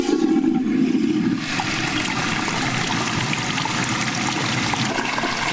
klospuelung

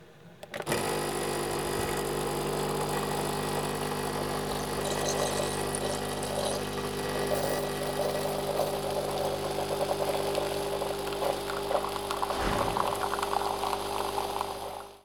Kaffemaschine

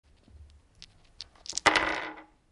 wuerfelsteinchen

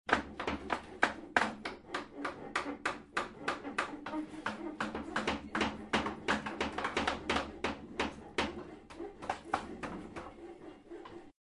holzwerkstatt

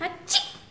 Niesen

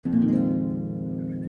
gitarrenakkord

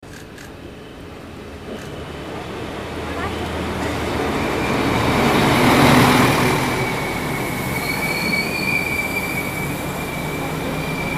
Zug kommt und faehrt

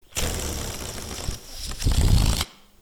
luftballon fliegt

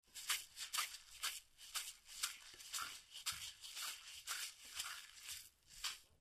pferdetrab

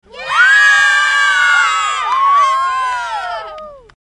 kinderschreien

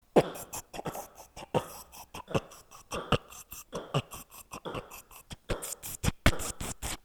beat boxen

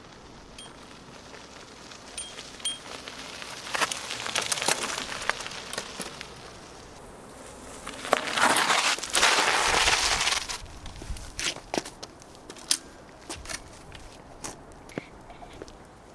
Rad bremst auf Kiesweg

